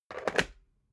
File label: water boiler put down slow